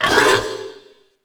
wretch tunnels sight; A Machine for Pigs

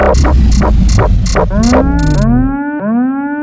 thumper shutdown; hl2dm; oppressive territory

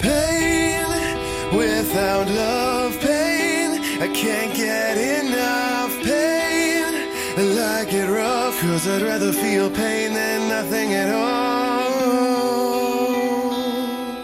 pain; hl2dm

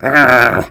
erhhh

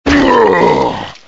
death; hl2dm

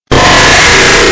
loud

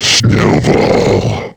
attack; tf; slender; kfxmas snowhusk

